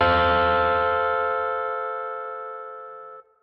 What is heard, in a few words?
Gtr F3